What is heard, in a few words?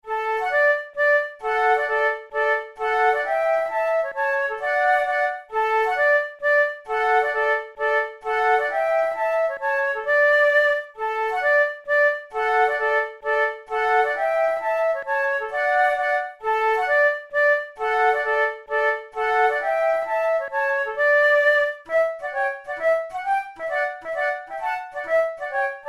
waltzes